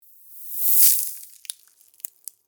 Cinematic transition freeze whoosh

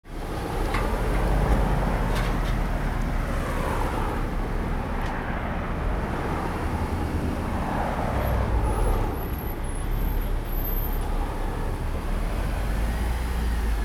Shopping mall exterior traffic with some cars

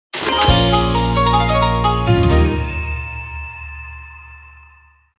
Asian slot machine casino win combination

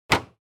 Epson ET printer close the scanner unit

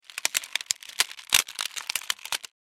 Chocolate wrapper foil wrap close; paper sound effects

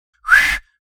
Human failed whistle

